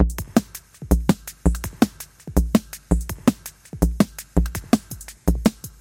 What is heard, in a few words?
drums combined